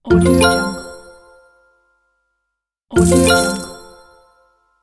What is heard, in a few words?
game success; sounddivision